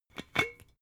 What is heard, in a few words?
glass bottle massive open cap short